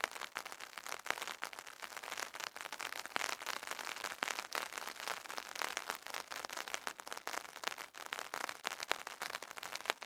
umbrella

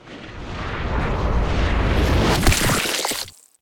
sniperrifle slow firing

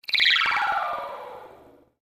magic chant

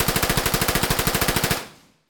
machinegun firing

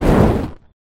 magic flame

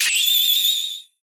shakin

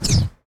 punch real swing

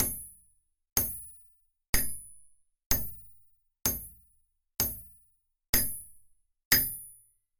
swordsmith

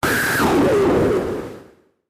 monster

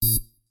beep

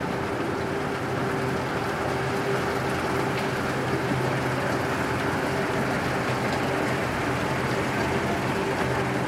escalator, machine

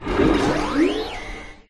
robot startup

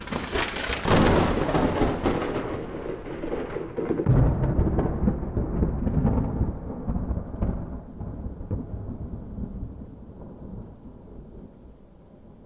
thunder

